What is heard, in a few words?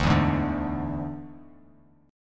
D Suspended 2nd Flat 5th D Bass Standard; 10,7,6,7; strummed